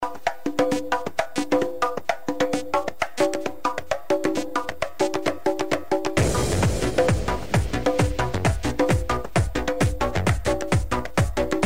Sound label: Iranian Music